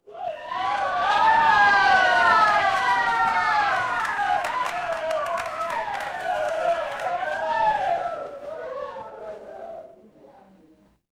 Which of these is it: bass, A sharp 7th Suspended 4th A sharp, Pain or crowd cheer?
crowd cheer